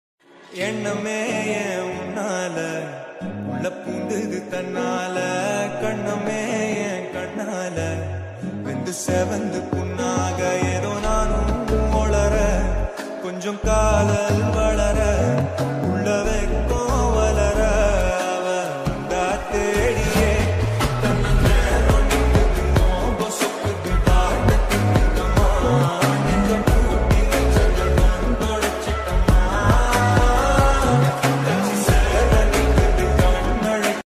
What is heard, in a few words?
slowed reverb ringtone